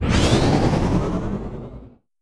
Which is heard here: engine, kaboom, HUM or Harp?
engine